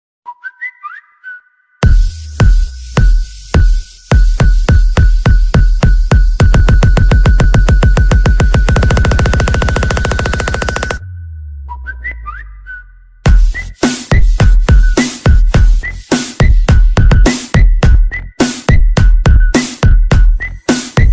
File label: bad boy attitude ringtone new english ringtone remix